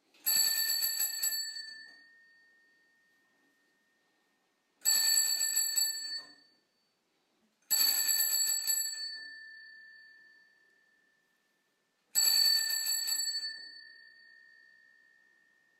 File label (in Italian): Campanello bicicletta